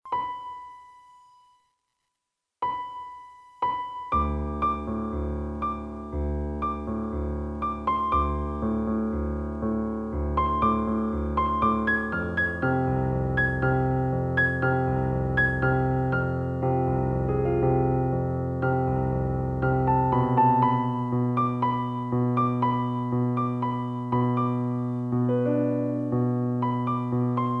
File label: Piano